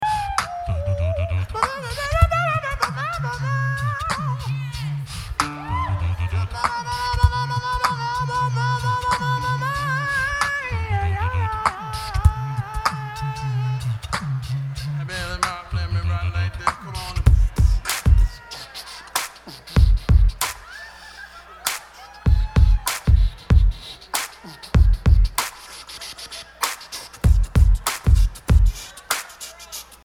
Funky Cowbell Jam

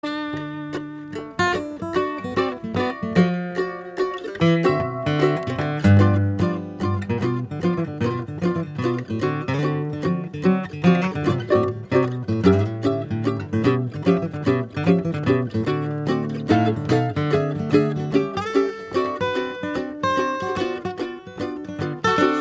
Bluegrass Stomp

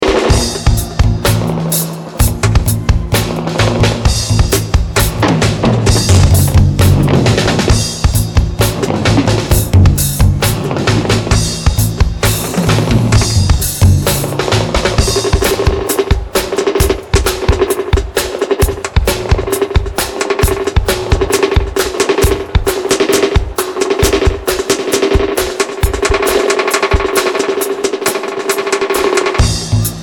Drum Dub

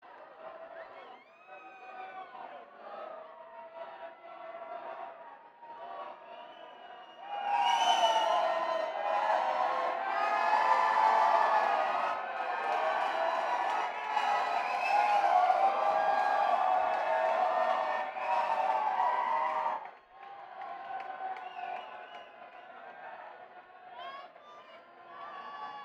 Crowd Banter

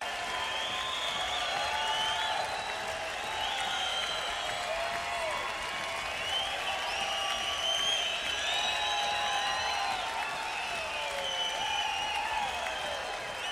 Crowd Noise

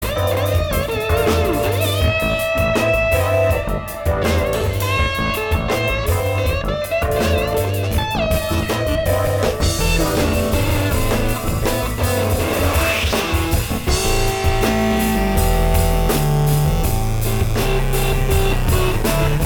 Improv Jam

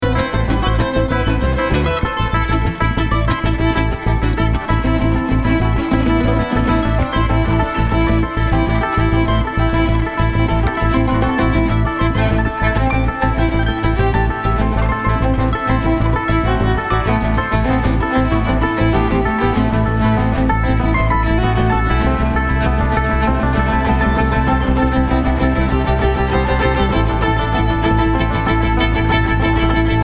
Traffic Jam

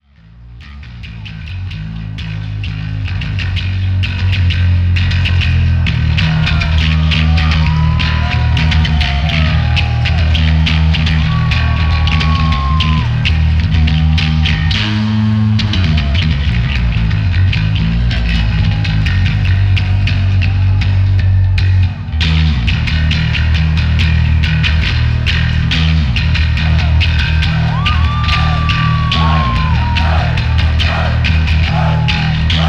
Bass Solo

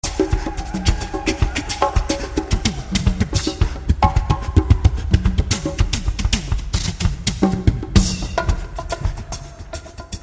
Percussion Jam

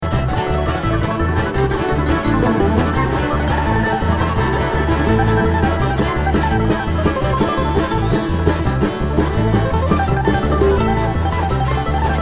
Traffic Jam

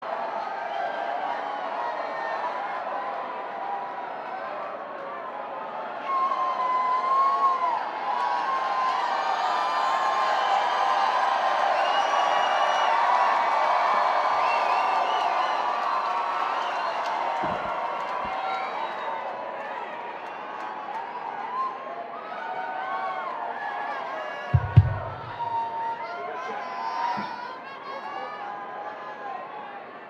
Banter Crowd

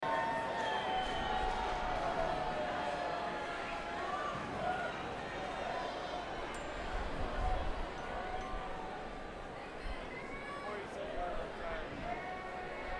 Crowd

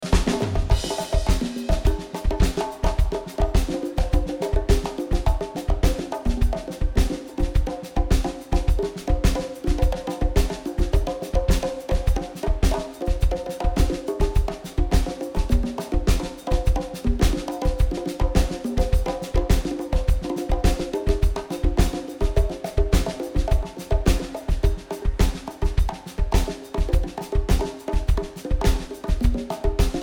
Drums

